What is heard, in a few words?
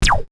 torpedo; Sid Meier's Civilization 4 Complete; Beyond the Sword; Final Frontier; Units